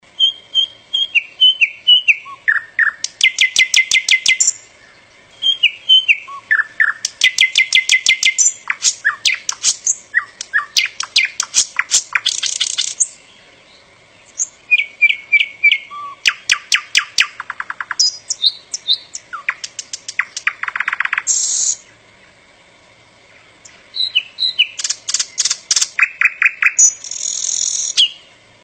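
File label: nightingale sounds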